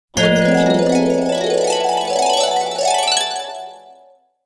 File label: dazzle bell harp time travel; fxprosound